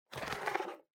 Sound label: Sensu Open